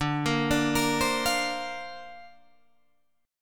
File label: D-Minor 7th Sharp 5th D Guitar DADGAD, chord